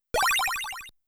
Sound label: Life Up; mother1